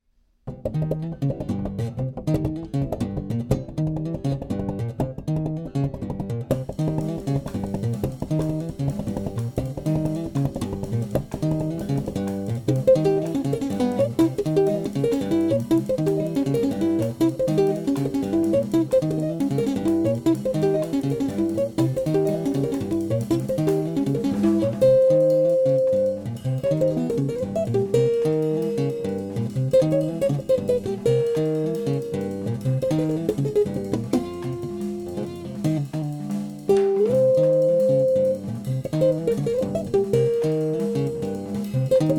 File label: quartet, latin ensemble